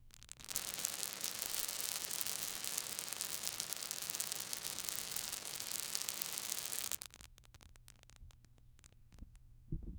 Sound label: mobile